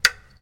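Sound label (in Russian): кнопка клик звонкий близкий в пространстве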